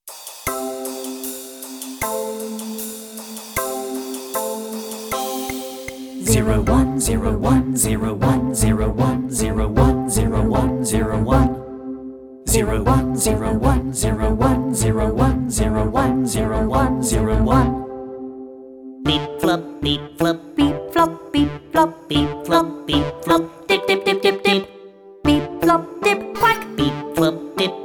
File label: zero one go vocal; join in with music